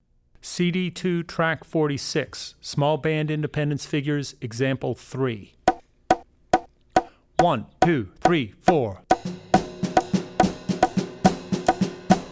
Jazz - Small Band; CD2 Track46 Jazz - Small Band (Example 2); Drummer's Bible Tracks

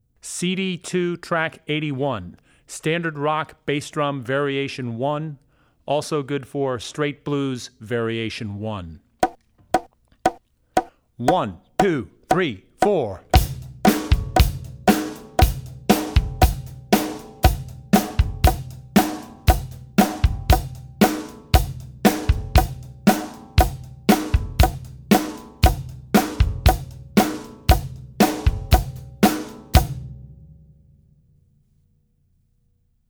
Rock N' Roll - Bass Drum Variations; CD2 Track81 Rock Bass Drum Variation 1; Drummer's Bible Tracks

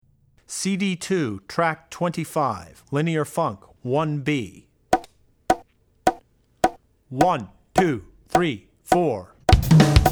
Funk - Linear Funk; Linear Funk (Example 1B); Drummer's Bible Tracks

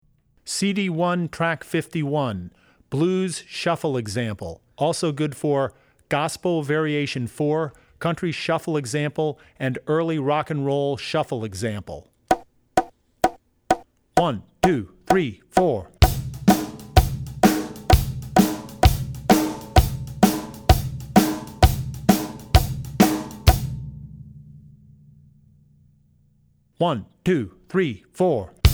Shuffle; Drummer's Bible Tracks

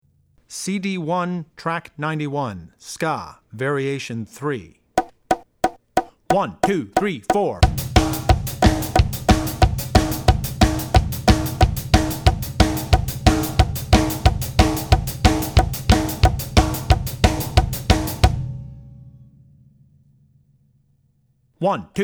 Ska; Ska (Variation 3); Drummer's Bible Tracks

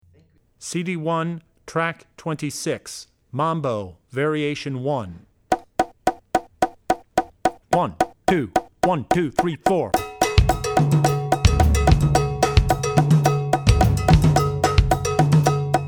Mambo (Variation 1); Drummer's Bible Tracks

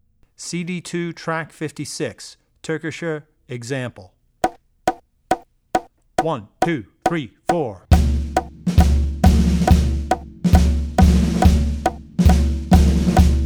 Klezmer - Terkisher; Drummer's Bible Tracks

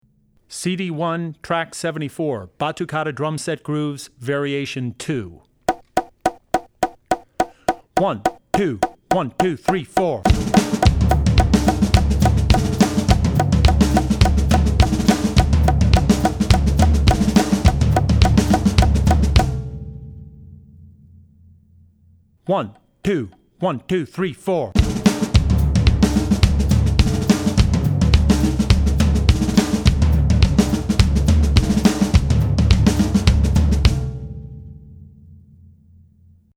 Batukada for Drum Set; CD1 Track74 Batukada for Drum Set (Variation 2); Drummer's Bible Tracks

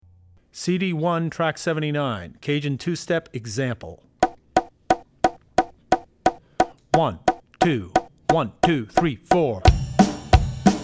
Cajun Two Step; Drummer's Bible Tracks

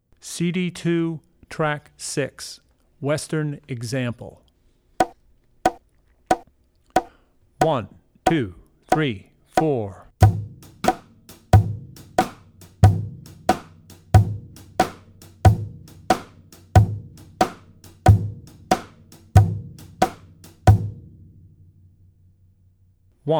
Country - Western; Drummer's Bible Tracks

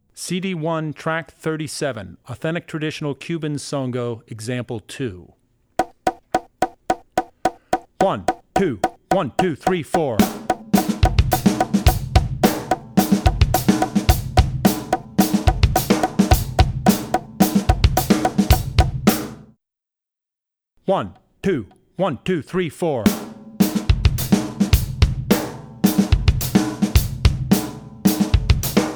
Songo (Traditional-1); CD1-Track37 Songo (Traditional-2); Drummer's Bible Tracks

